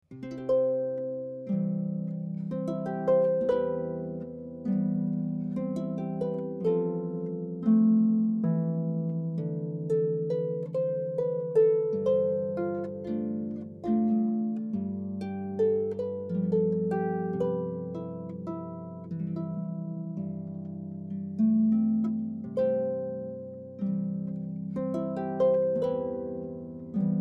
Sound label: waltz